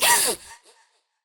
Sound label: Girl Pain B; Warframe; Zariman; Skitter Girl